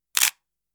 Camera shutter click

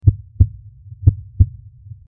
Heartbeat

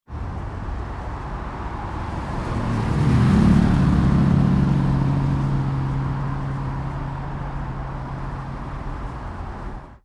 City traffic

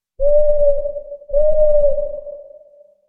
Owl hooting

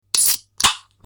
Soda can fizz

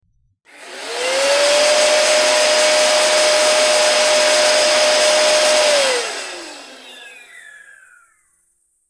Vacuum

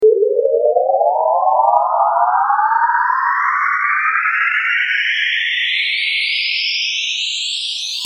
Teleportation

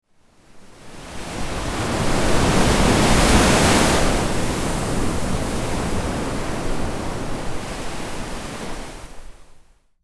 Ocean waves; Sound FX

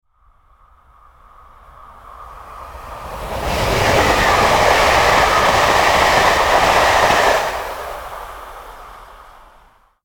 Train passing

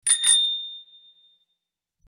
bicycle bell; Sound FX

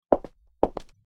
High heels walking; Sound FX

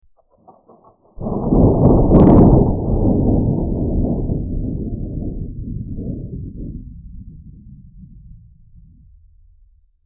Thunder

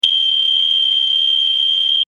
beep

